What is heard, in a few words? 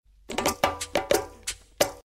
New Msg Tone